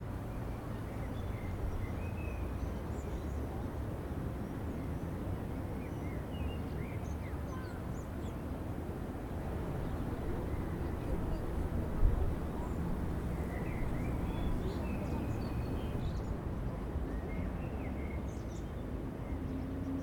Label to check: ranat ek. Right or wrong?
Wrong